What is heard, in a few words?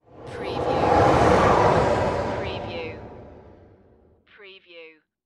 Spaceship Pass By